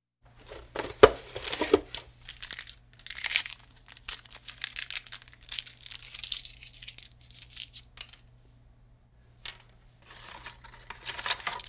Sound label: Hospital effects